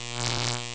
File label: zap